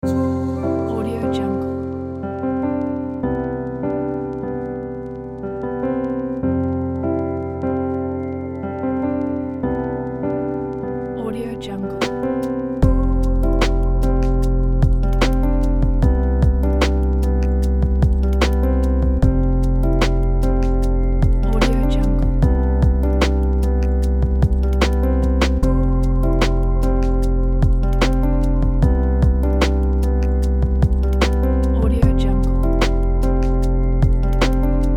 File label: ambient lofi lifestyle